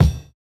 Kick, jungle breaks drum sounds

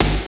snare BASS, Roland; Roland L-CD701; Snares 6; Sn Modules 6

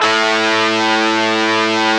GTR FUZZ, Metal; Club-50 - Foundations Roland; Metal Chds; Power Chds

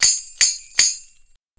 CYMB, Indian Traditions; USB Soundscan vol.45 - Indian Traditions; AKAI

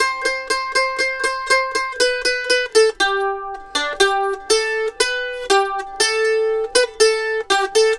Mandolin Lead; Continental Drift Aftershocks

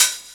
DR. LOOP, DRUMS; X-Static Goldmine 3

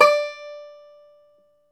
FLAT TOP, Flat Top Banjo

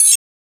fx-percussion, Techno; Techno ID; single-drums

